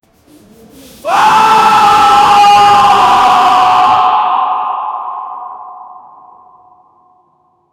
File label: a blood curdling scream echoing in a dark alley